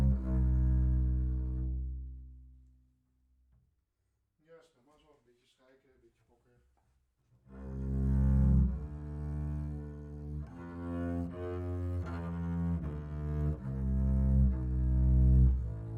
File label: Bass Mic